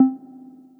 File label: Menu Click